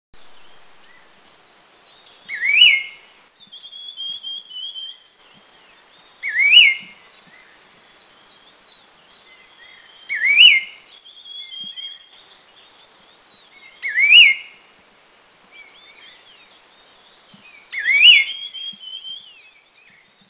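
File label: Chestnut-crowned Laughingthrush; Eaglenest; India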